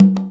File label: CONGA, Percussion; InVision Interactive; Percussion Slam